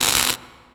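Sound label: electric spark welding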